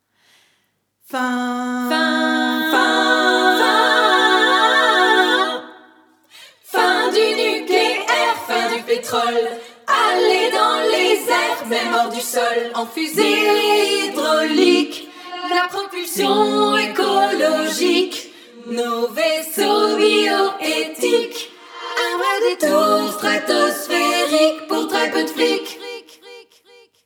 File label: Jingle air